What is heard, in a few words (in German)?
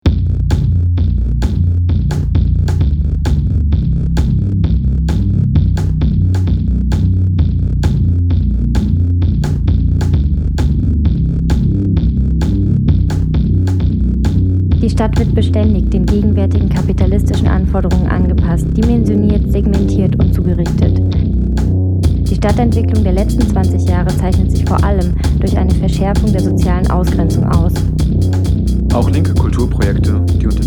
Techno Jingle